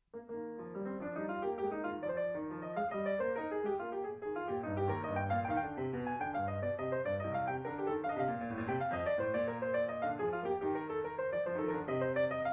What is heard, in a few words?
piano; BachCourante